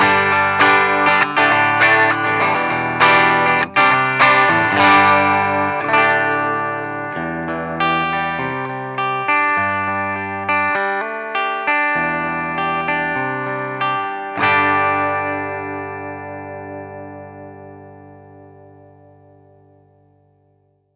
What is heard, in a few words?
mod cub 8 jensen and jj half gain; laney